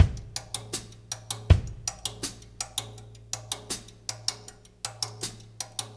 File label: Russ Loop, Laidback; Sonic Foundry (Sony Creative Software); Drum Tools